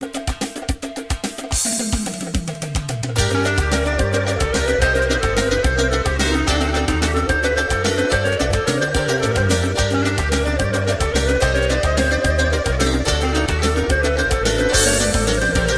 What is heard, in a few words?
Kavkaz Dance clarinet